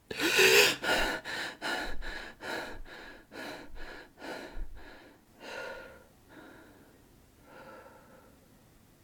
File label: gasp relief; outfly